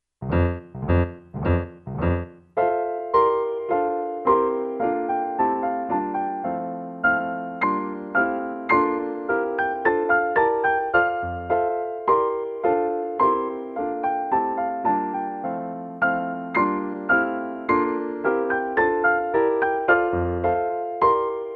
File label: PIANO; Um dois